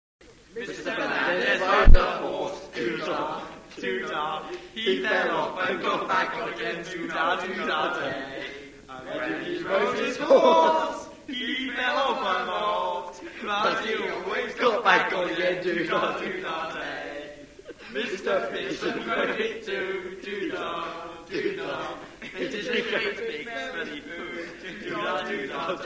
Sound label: Horse